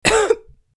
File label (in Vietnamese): tieng ho ngan 1 tieng duy nhat